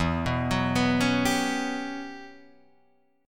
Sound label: E Minor 6th Add 9th, Guitar, Drop D; chord shape